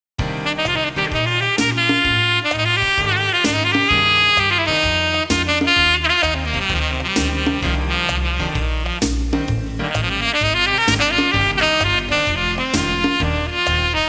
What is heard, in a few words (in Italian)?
suonerie musica jazz